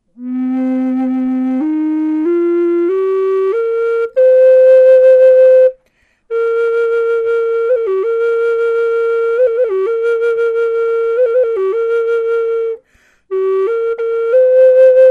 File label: Maple Burl Classic